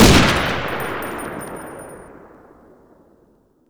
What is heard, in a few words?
k98 shoot; weapons